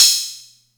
Atlantex Music Percussion Computer Cymbal Short, drum machine